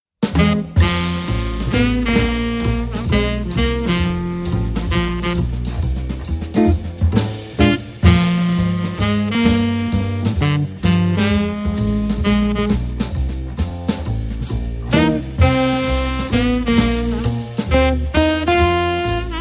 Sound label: Big Blues